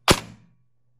airsoft; weapons

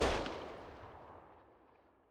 fn fal rev; weapons

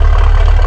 idle, engines; rise vehsounds; S63

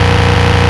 low, engines; rise vehsounds; S63

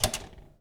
gear up, motorcycle

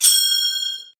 furnace done pick

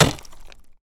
pickaxe hit

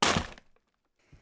trash

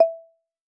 select done

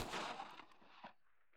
colt rev; weapons

